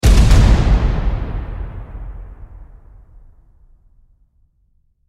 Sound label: boulder strike